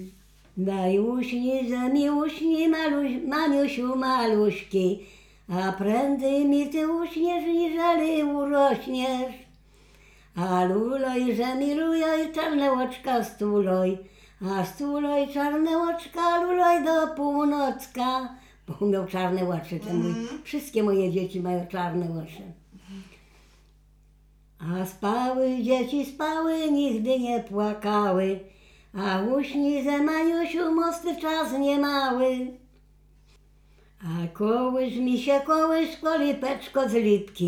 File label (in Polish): kołysanki